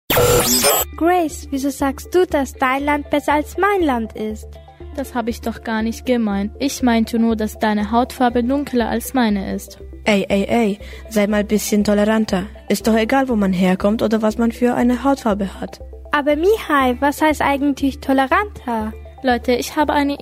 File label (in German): Jingle Toleranz